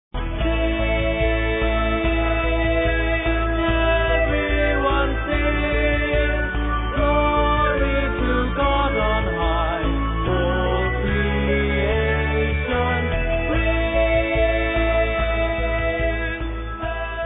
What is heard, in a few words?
sing